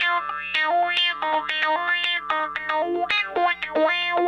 Raw Funk Guitar; Sonic Foundry (Sony Creative Software); What it Is! 70s Analog Funk

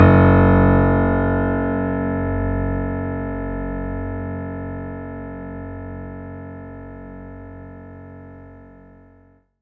F#1, synth; 90 s Sample CDs; NiceBeats; SynthLine VOL-23

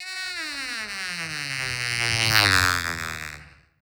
CAR BLASTER, SYNTHETIC; AKAI Anime Sound FX

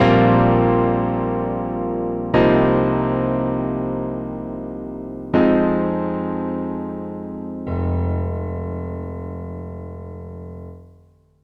Keyboard; Resolution; Zero-G Smoke

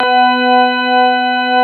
CX3 ORG B3, Keyboards Old School; USB Soundscan vol.09; AKAI

